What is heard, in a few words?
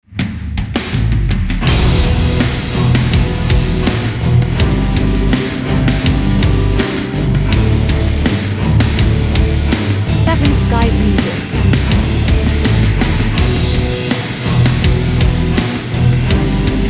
Rock Underscore